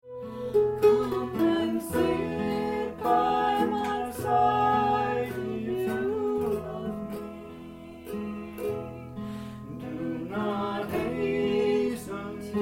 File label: guitar and vocal, mandolin and vocal; At Tidbits Fiddle n' Farm concert series